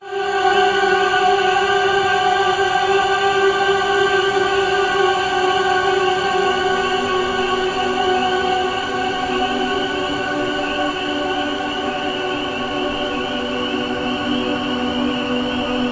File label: background music